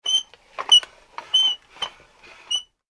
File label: toy tricycle moving, Toy Wooden Tricycle Moving/Being Ridden; Miscellaneous Cartoon; Cartoons; McKinney Sound